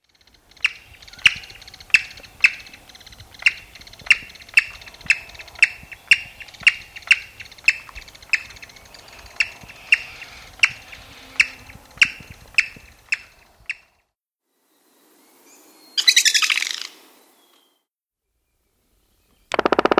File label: Black backed Woodpecker